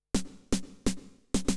SNARE HOUSE 125; eJay

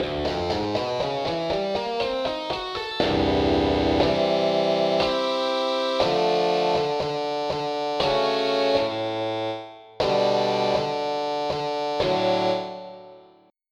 Distortion G; FF7

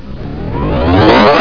SPREADER; Meteor

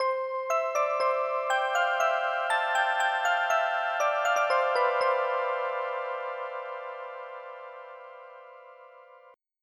music box; Roland - An Invitation To The Roland World Of Music

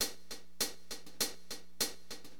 Acid Jazz Funk, Drums; LiveAct Pool

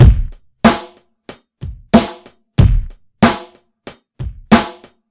Electronic Drum, Loops

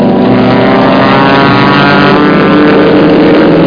plane

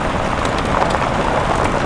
OFFROAD; Andretti

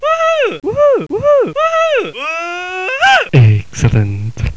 woohoo; SOCDEMO

